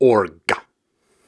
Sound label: Syllables and Imaginary Words, Viking Vocal, Hard